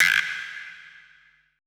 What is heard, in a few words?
GUIRO, PERC; BPC PERC